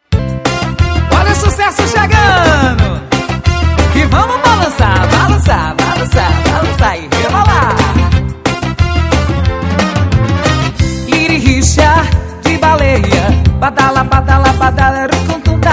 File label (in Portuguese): vocal ao vivo